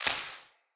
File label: Casting Device Projectile Destroy; Weapons; Player